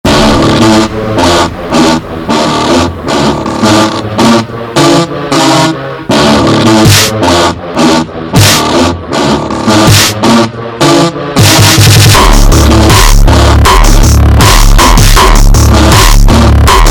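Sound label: Tuba Hardtekk slowed, funk remix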